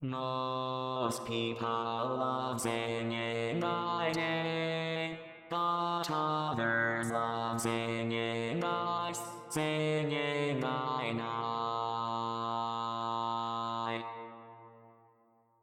Singing By Day, Bass, Barbershop

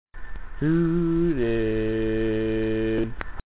Bass, Barbershop

